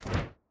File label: environment mob release all